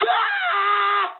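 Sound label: aircraft destroyed scream, speech japanese; War Thunder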